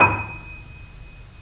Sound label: The Piano, D#; InVision Interactive - The Piano; BOESINGSOFT